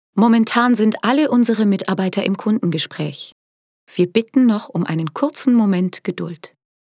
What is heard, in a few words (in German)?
telefonpaket warteschleife